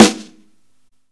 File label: Snare Live, Drum and Bass